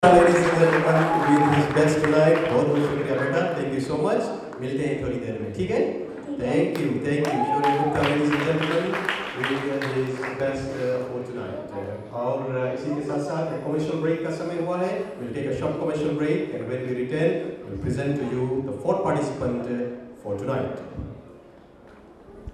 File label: karioki stage show, Vietnam; 2019